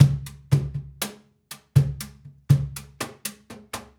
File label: Cajun, Percussion, Drums; Essential Percussion